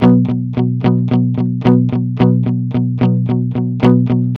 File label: ROCK B 110, Vintage Blues Guitar; USB Soundscan vol.22; AKAI